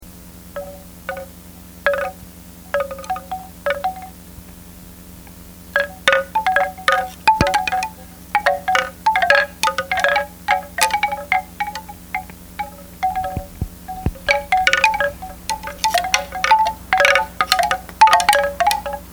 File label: chimes